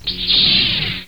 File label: lightsaber ignition; Star Wars